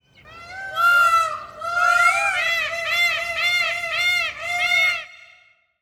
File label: Peacocks Singing; افکت صدا حیوانات; Animals